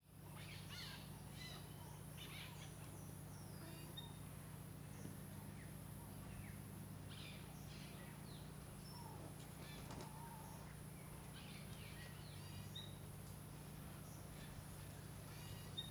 Ambiencia em Quintal da Sede Fazenda Simao Correa; ambiencia rural

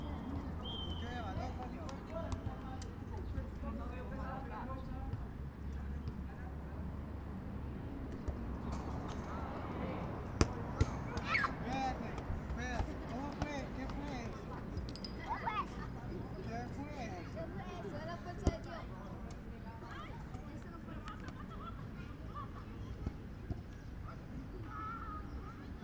Quadra de futebol sao sebastiao com criancas e transito, no meio pouco tra; Cerrado

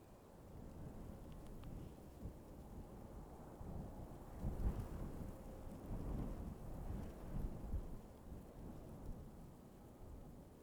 Vento suave na grama na estrada de sao jorge

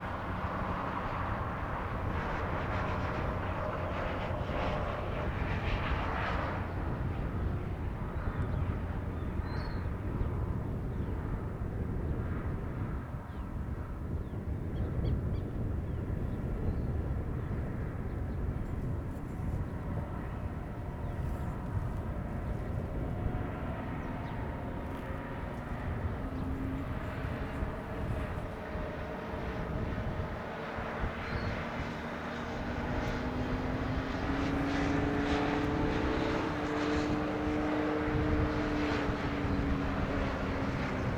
Ambiencia estrada com transito, muito vento e aviao passando

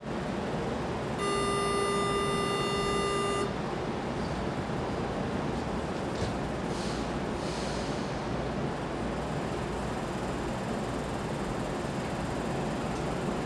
Porta do Metro de Brasilia com Sinal de Alerta; portas

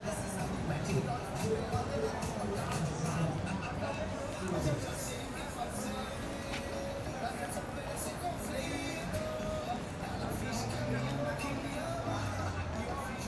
Ambiencia em frente as lojas do Setor Comercial Sul com musicas; musicas reproduzidas

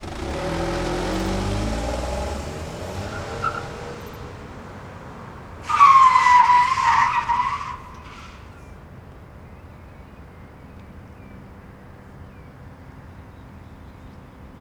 Carro acelera e logo em seguida freia cantando pneu; maquinas

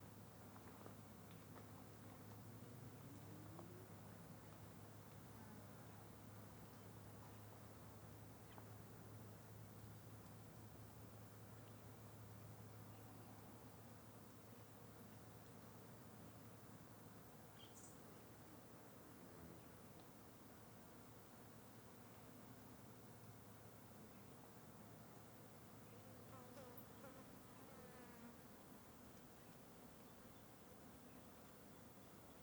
Vale perto da serra periquitos voando insetos; aves

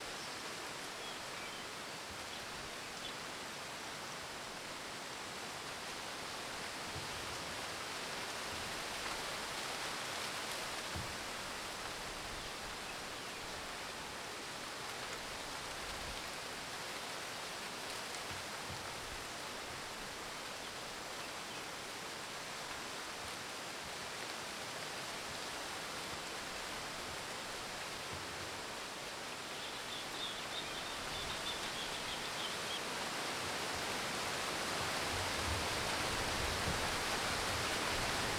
Aldeia Karajas com vento e passaros

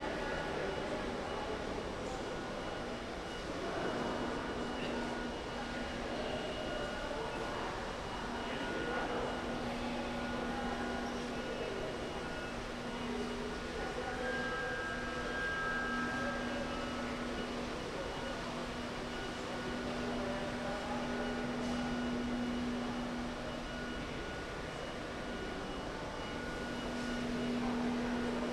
Aspirador de chao com vozerio; maquinas; colecao sonora do cerrado

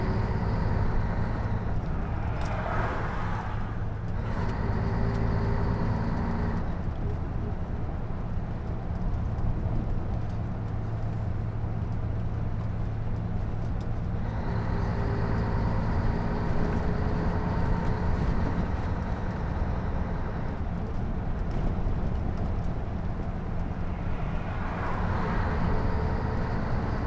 Interior de onibus em movimento motor varias velocidades; Cerrado; maquinas; colecao sonora do cerrado

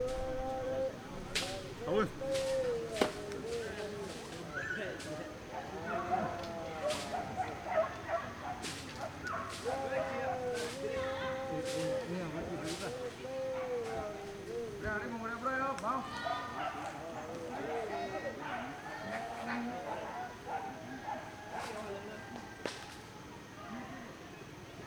Homens contruindo na aldeia cortando palha; construcao

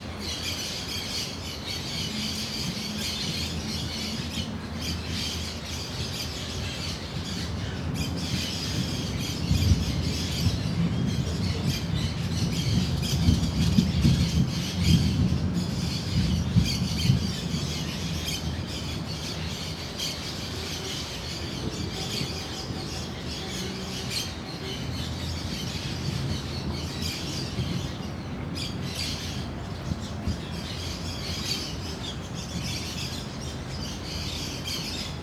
Trovao grave e longo com muitos passaros e cachorro

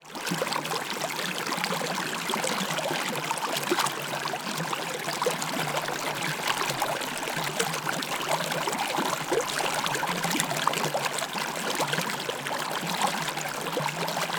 Agua correndo entre pedras em rio de Cavalcante Som mais grave

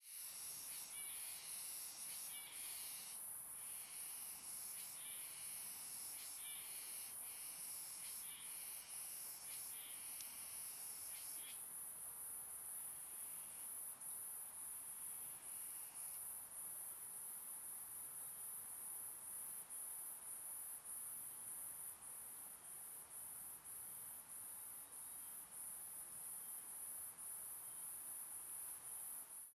Cigarras e Grilos Agudos em Ambiencia Proxima ao Rio; Rio Simao Correa; Cerrado; insetos; colecao sonora do cerrado

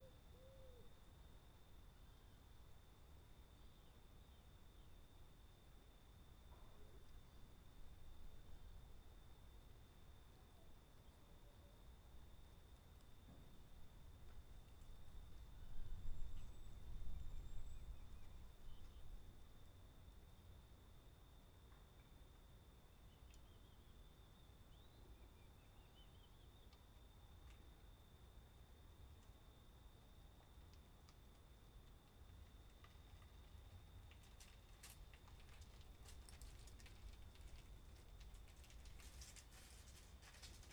Ambiencia sitio a noite com grilo, passaros, passos na grama e pouco vento; ambiencia rural

